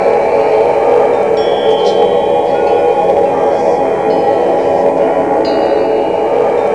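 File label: F#3 voice; Northstar - The Wizard VOL-1; VOXTAGON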